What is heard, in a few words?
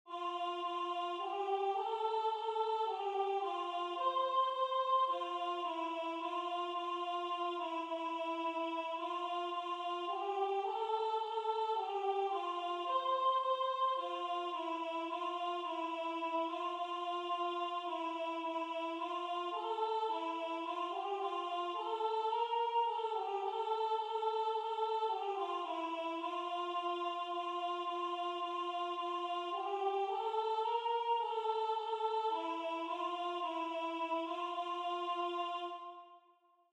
Alto